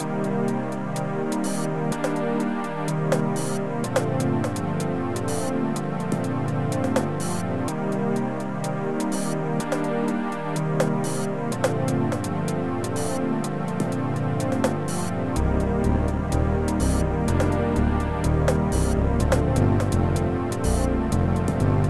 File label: nearly elevator music